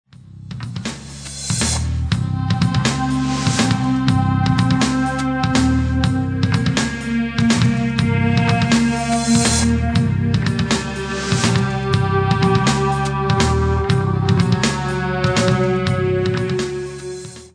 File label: synth, Amplis Mesa Boogie; Short example of a synth sound I use. Fade in is done / with a midi controlled volume pedal